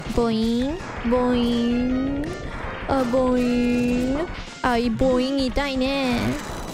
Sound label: boiinng boiiiiing